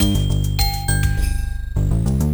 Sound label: Lake Start; Mission in Snowdriftland